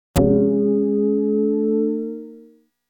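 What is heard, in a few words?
electronic bow hammer; music electronic; Asian Show Sound Effects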